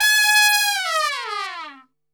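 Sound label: G#3, Brass Super Section, trumpet; Best Service; TRP LNGFLL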